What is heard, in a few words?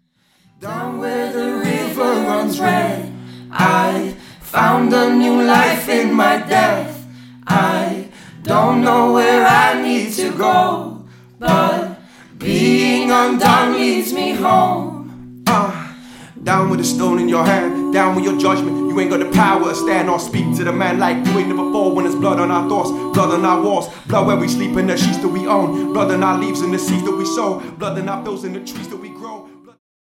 undone acoustic